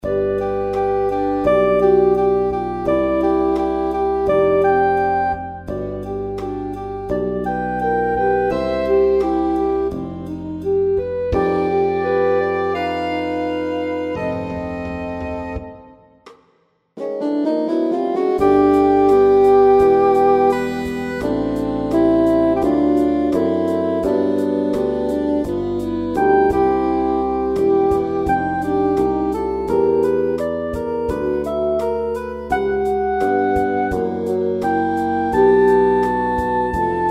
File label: saxes